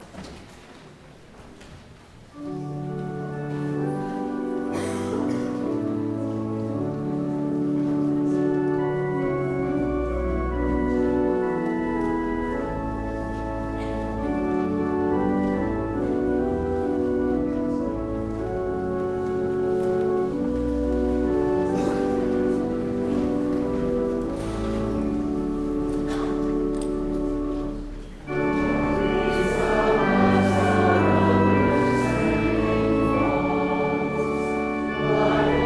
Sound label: hymn